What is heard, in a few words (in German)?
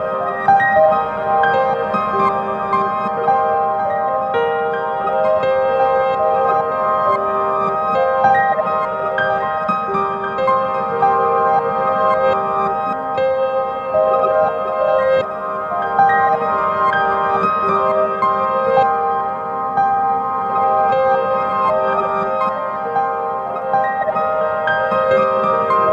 ADDAC 112 VC Looper und Granular Prozessor Piano Loops nach 2 Minuten Dub